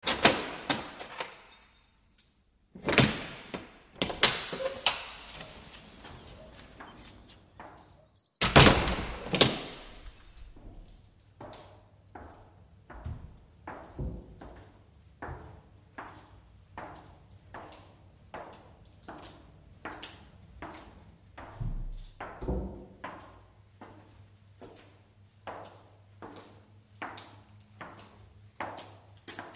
footsteps through the house; ham house

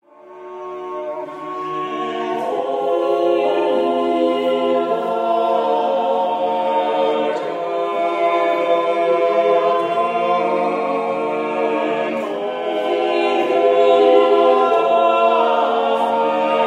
praeter rerum seriem rehearsal; josquin

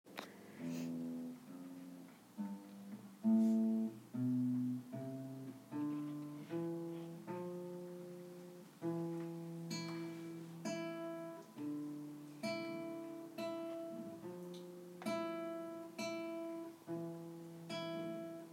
guitar solo

